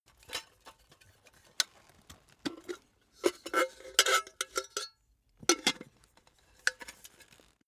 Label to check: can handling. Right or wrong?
Right